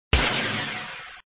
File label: Sound SFX, flipper